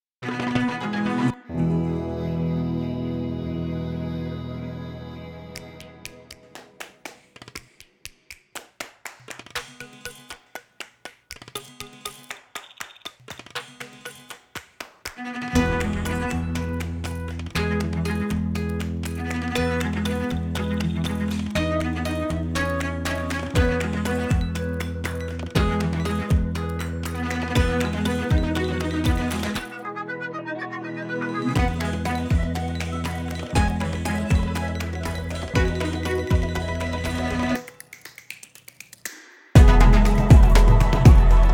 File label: theme no vocals; atlassian